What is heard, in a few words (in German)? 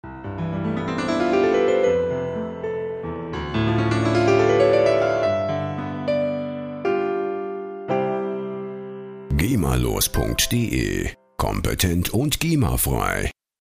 piano tusch